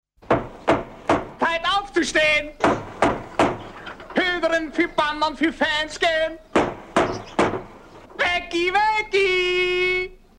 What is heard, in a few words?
Jingle; Original Wecki Wecki